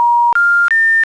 natura voip err; Spik

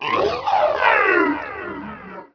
growl; robotron

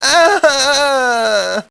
death; SINDEMO; civmale

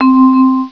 Vibes; SoundMusicSys; SnapDragon Music

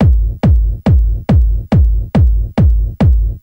ibiza, Techno Drums; TechnoMusicMaker

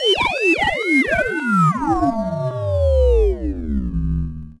siren down, alarm police; environment

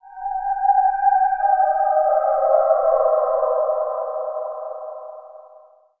sphere, ambient, 160; eJay

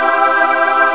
choir

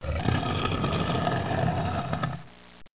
GROWL; Broderbund Print Shop Multimedia Organizer Deluxe Edition

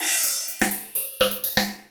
PERCLOOP HOUSE 125; eJay

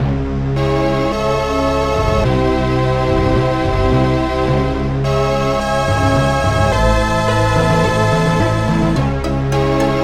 SYMPHONY

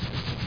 scratch

